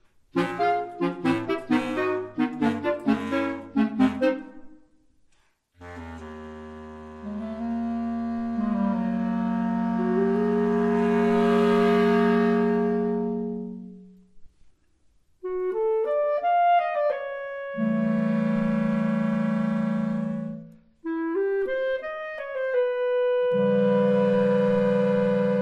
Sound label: Clarinets